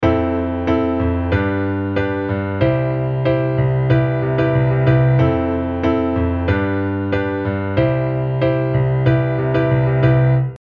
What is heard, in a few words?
II IM7 piano